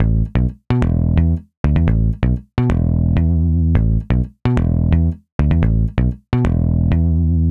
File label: 128BPM Melody; Vengeance Samples; Doubleride Kit